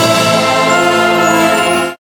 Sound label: win; Amatic; Book of Fortune